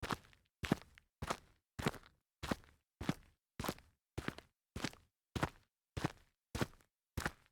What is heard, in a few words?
walk gravel, foley